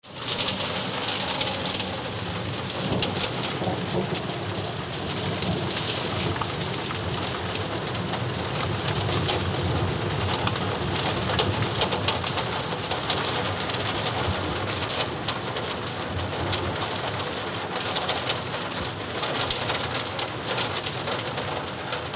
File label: hail